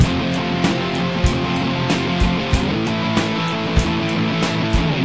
095 prog rock F to A guitar drums; SAMPLED MUSIC LOOPS

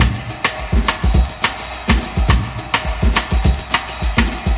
swing set, 105 bpm, drum loop

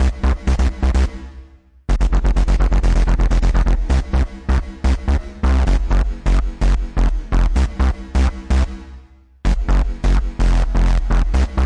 127 verby hard synth bassline

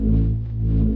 oscillating hum loop; Weird and Interesting Hits; single hits

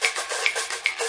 bright samba, 110 bpm, drum loop; 101 to 110 bpm

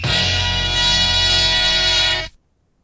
orch hit

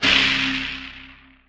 pad splash, Synth

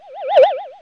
ufo flight

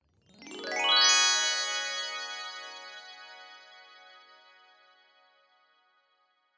glock run up blacks; Piano and Epiano

